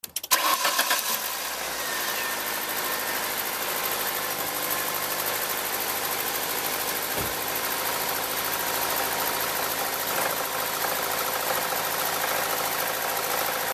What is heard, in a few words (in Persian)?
صدا ناک زدن موتور